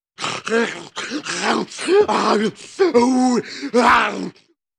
Zombie Growling

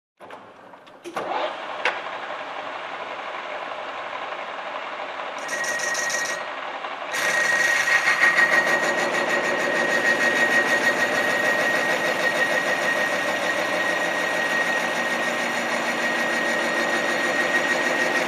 Construction

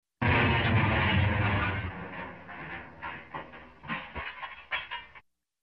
Glass shattering in slow motion

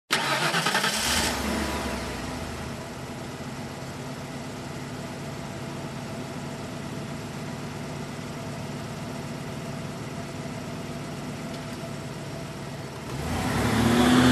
Car engine ignition and drive off

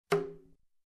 Single Conga Hit Low

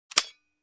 Gun trigger

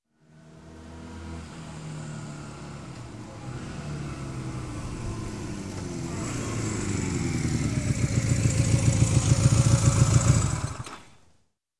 Motorcycle approaching and turning off engine

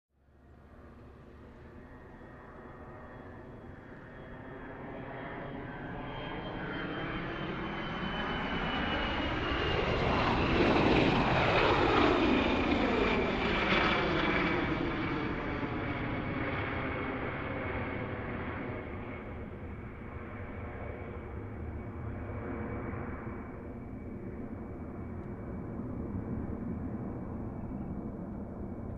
Airplane on a runway whirring

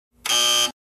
Buzzer Horn

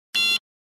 Machine Beep

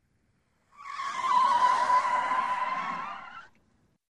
Car Drift

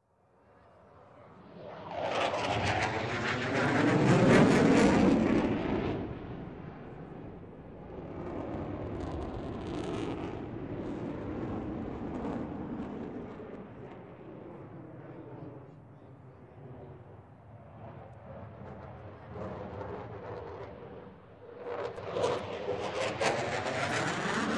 Two High Speed Jets passing by

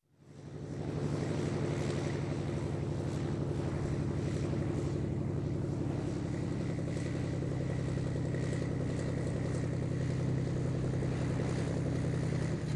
Propeller aircraft parking with engine shutdown

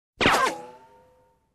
Bullet sound

